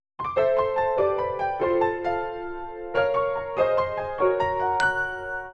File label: Piano Steps, alarms